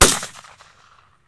SHOT; Deadly3D; E-MU Producer Series Vol. 5 – 3-D Audio Collection; 3D Percussives

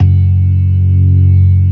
ORGAN, C3 NO PERC; Keith Emerson Signature Series

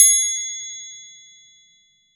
triangle; TRIANGLE2

